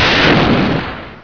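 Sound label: lightning; Hexen II; crusader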